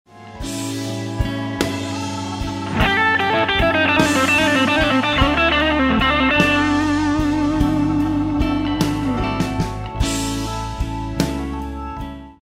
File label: Blues Lick, guitar; blues method licks and solo; Blues Guitar Method 1